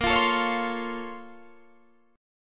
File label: A sharp Minor Major 9th, Guitar Half Step Down